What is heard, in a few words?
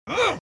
Mom Vox Grunt; The Binding of Isaac